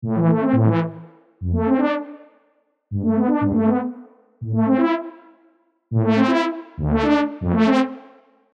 collapsed to mono

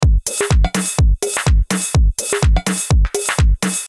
Drum Loop Tape Saturation

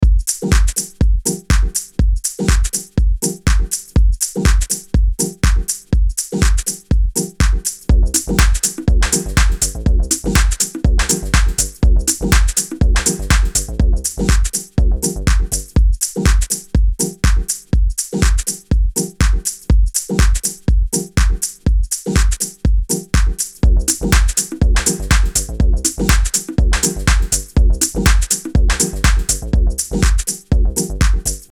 deep house EQ'd; Live 9

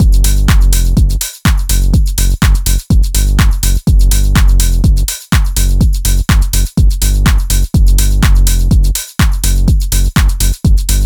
Full Loop

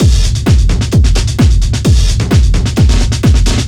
Bus Processing and Kick Drum

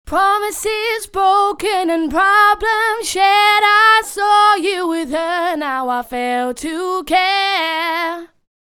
up a semitone

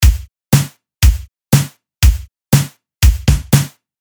Beat Dissected Nu Disco Cosmic Disco

